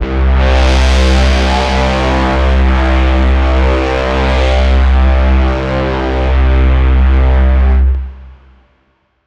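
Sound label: deep heavy bass futuristic, braaam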